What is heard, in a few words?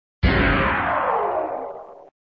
Sound SFX, flipper